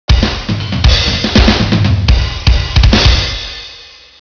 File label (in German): schlag, strument